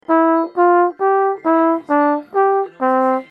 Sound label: trumpet